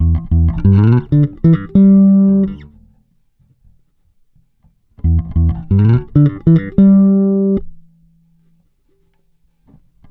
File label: BALBOA 95, Bass Grooves; USB Soundscan vol.30; AKAI